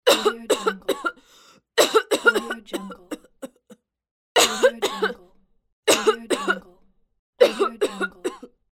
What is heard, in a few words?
woman coughing; medusamusiclab